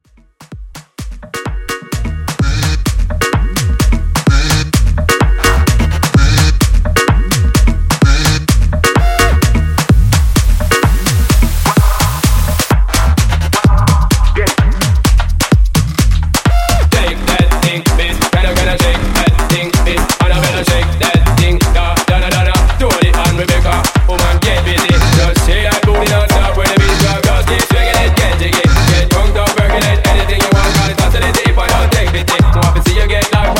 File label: Afro House, 126 bpm